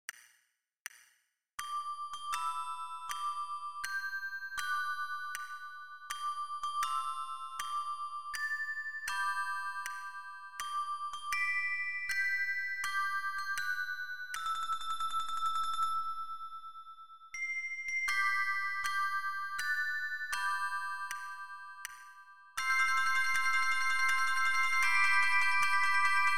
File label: count HBD